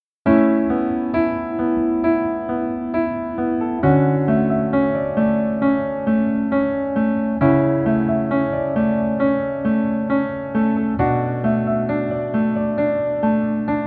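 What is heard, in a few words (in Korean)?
Piano version 멜로디MR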